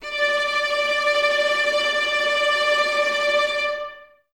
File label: Violas Tremolo D 4, String Ensembles; Miroslav Vitous